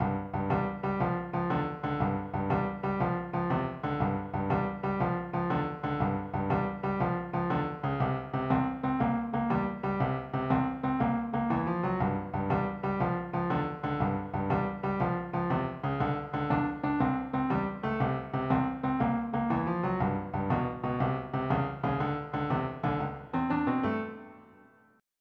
blues

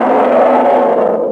BEAR; animal; animal fun

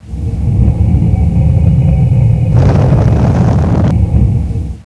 wall up

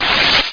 LID OPEN; Deep Throat Girls 4

